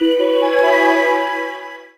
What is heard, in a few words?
Start; Zenses Ocean; nds gamerip